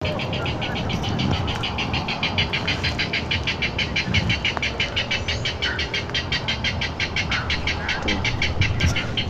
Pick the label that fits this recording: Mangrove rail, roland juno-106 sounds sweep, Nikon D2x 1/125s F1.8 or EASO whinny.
Mangrove rail